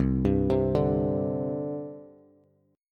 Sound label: C Suspended 2nd, C, down, Bass; Csus2 arpeggiated